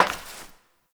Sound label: Step Glass; Warframe; Koumei